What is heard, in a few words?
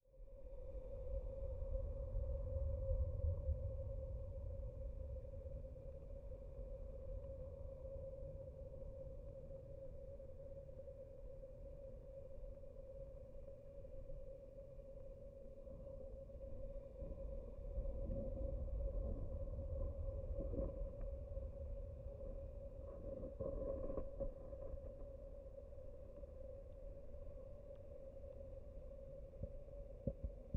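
Hydrophone, Room Tone, Haunting; underground sounds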